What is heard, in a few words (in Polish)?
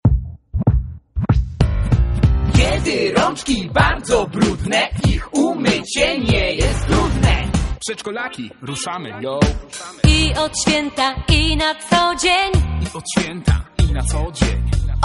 wersja wokalna